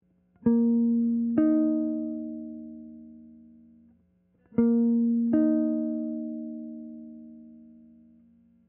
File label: Intervals P4